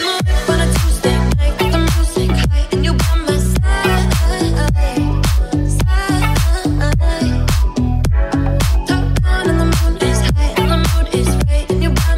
Electronic Ringtone

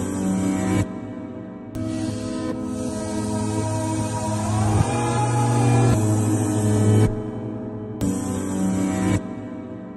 Infinix Smart 3 Plus Ringtone

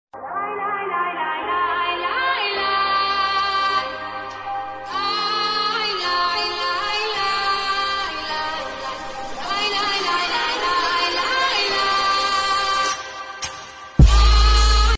remix

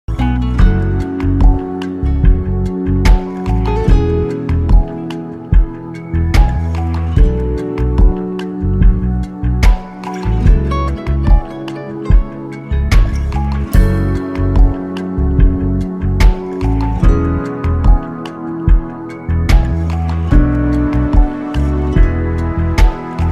Punjabi ringtone

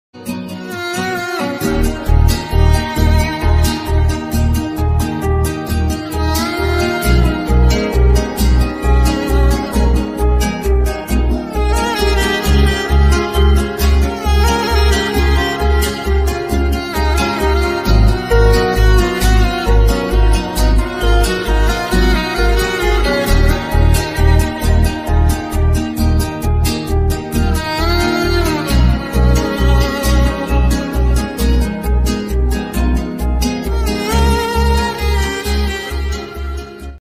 Romantic Love Ringtone Tamil

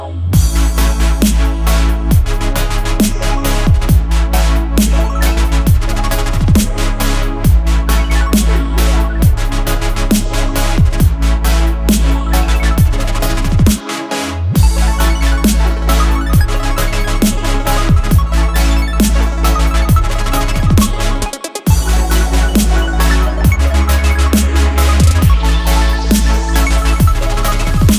Vivo Ringtone

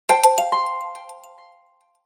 Samsung Galaxy Grand Quattro sms

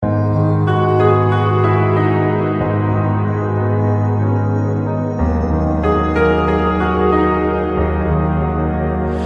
Romantic Clicks Ringtone; Oppo

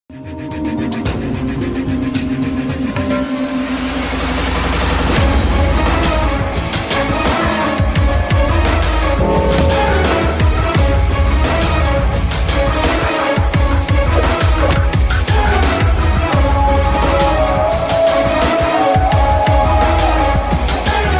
Fight Song Ringtone; Auburn

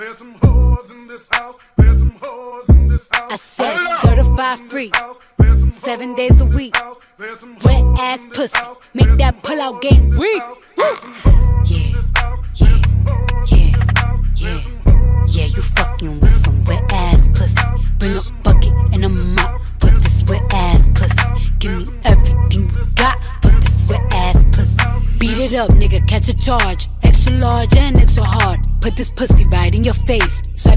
English Ringtone

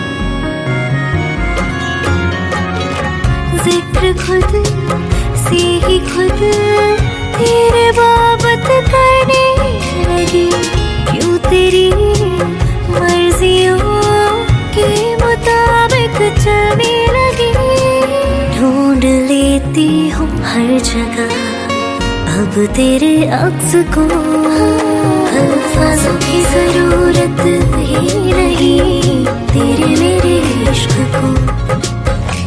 Punjabi Song Ringtone

